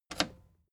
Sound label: plastic button slack push